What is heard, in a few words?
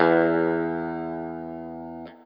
Guitar & Bass; 90 s Sample CDs; Quantum Leap - Guitar & Bass